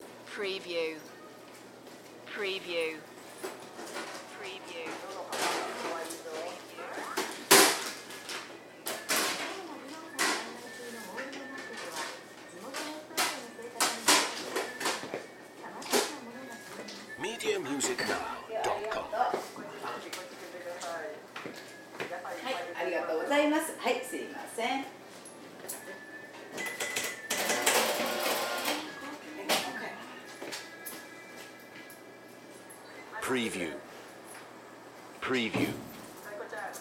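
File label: ambience merchant female register Tokyo Japan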